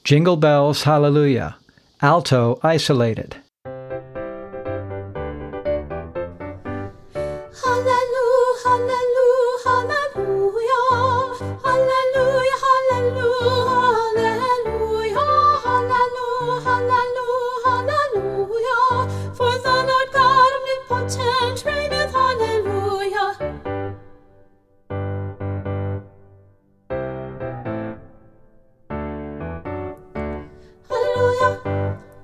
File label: ALTO ISOLATED; practice tracks for singers